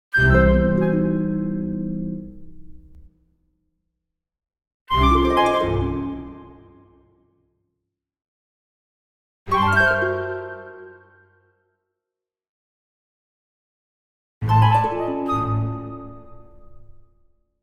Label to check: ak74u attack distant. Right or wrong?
Wrong